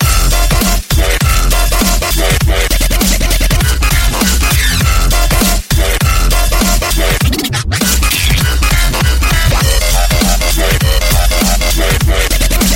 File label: Dubstep Tone